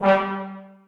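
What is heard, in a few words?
trombones staccato g3; Sonatina Symphonic Orchestra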